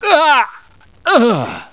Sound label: die; barney